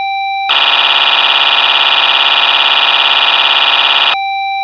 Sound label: juno-106